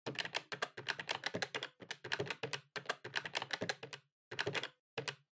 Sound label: Calculator (Pattern)